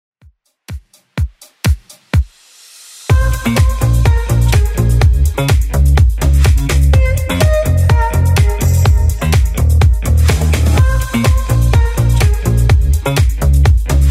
2ks Redrum 125bpm

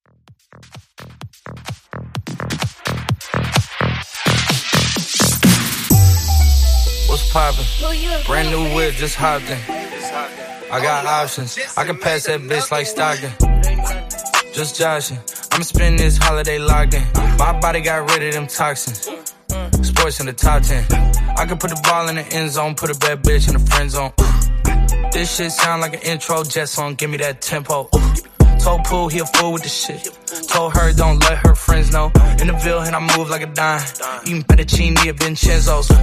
Melbourne Bounce Dirty

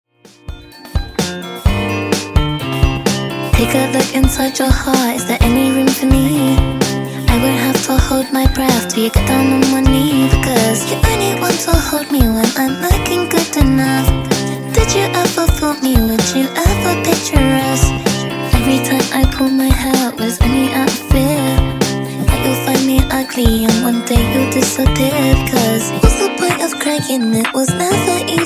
Funk Booty House Clean 128 Bpm

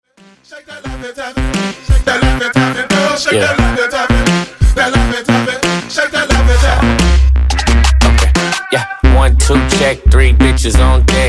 Mrs Bubblegum Funk Wordplay 88 92Bpm

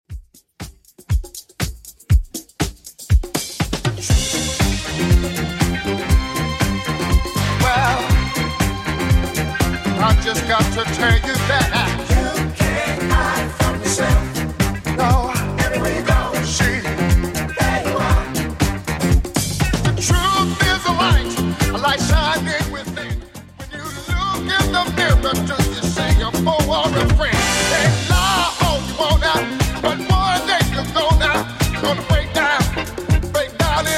70s Redrum 120bpm

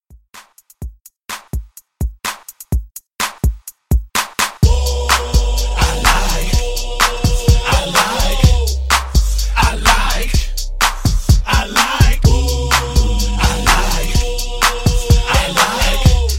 Redrum Hype; Remixfreakz

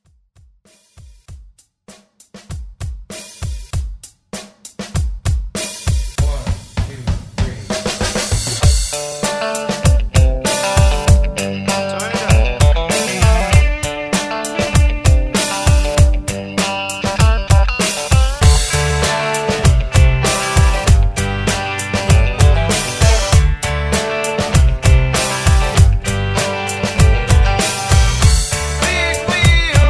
70s Redrum

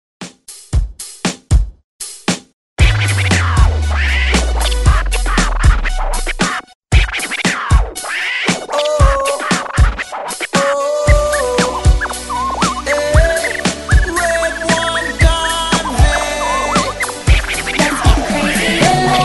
Scratch 116Bpm; DJDOLLS

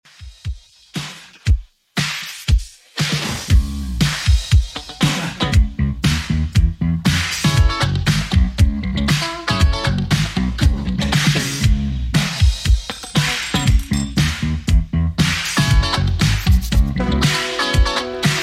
80s Redrum, 118 bpm

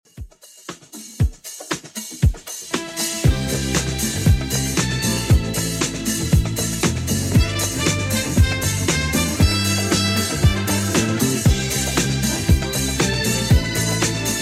70s Redrum 117bpm CLEAN

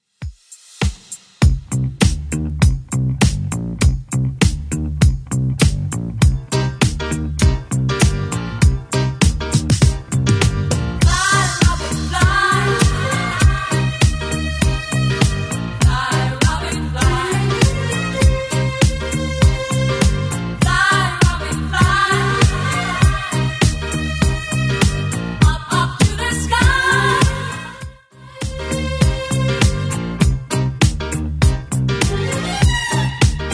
70s Redrum 100bpm

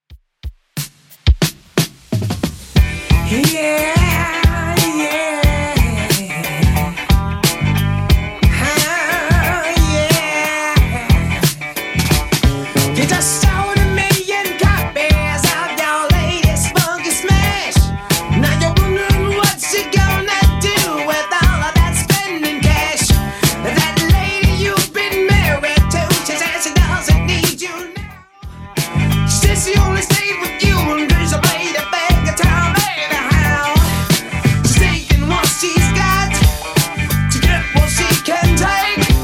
70s Redrum 90bpm

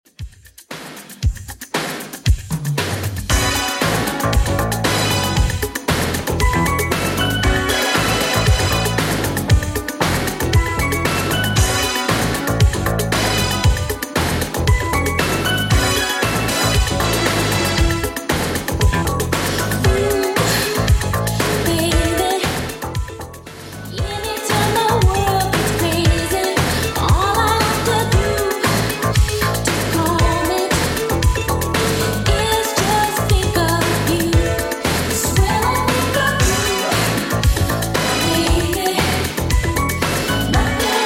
80s Redrum 116bpm

